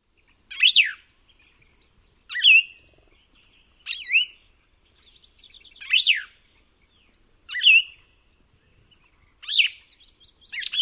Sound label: song